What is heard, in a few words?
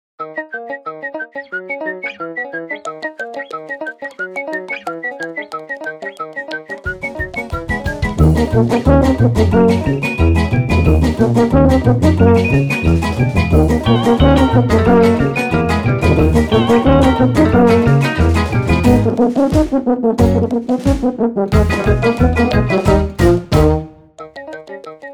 Polka on Espresso